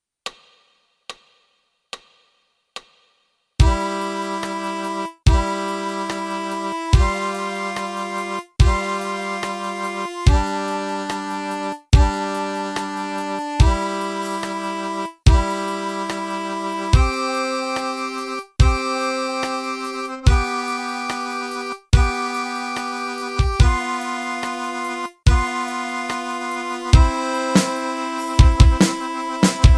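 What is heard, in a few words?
Armonia, Fisarmonica, Mano Destra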